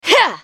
spellcast effort; Akali

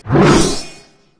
basic attack; Yasuo

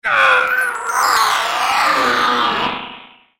dying; CyberEzreal

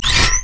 heat seeking missile lock; urgot; LoL

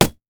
blue minion melee basic attack; LoL

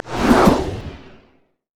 recall lead out; lulu skin04

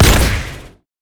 carpet bomb; rumble skin03

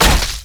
basic attack; sejuani

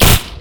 spray and pray; LoL; gangster twitch

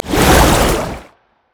launch; LoL; syndra

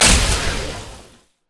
spider attack; LoL

